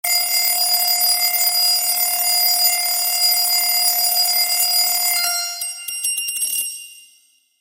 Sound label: alarm broken; ringtone